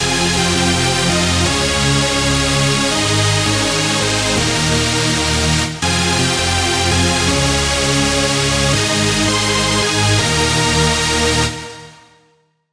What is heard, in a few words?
Chord, Serum 2; Chord - Flame Choir; Routed Sound; Serum 2 Expansion - Flame Summoner Vol.1